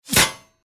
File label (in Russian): metallicheskiy udar